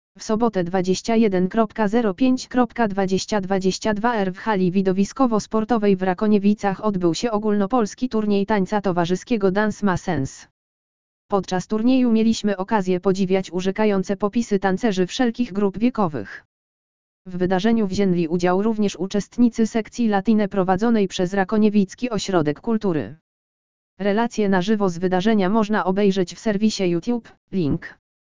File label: latina; ogolnopolski turniej tanca towarzyskiego dance ma sens